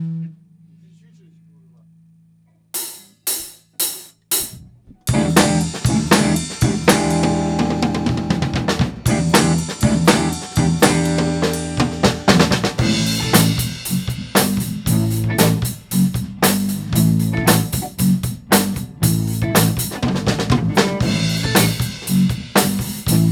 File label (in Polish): funk, rehearsal